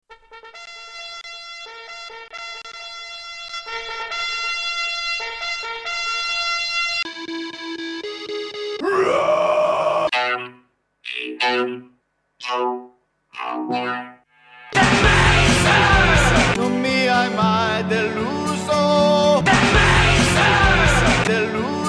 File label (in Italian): Suoneria